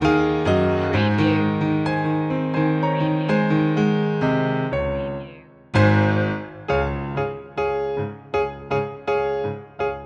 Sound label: piano; jingle bells; media music now